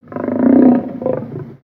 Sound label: Pet Trike Idle; creatures pets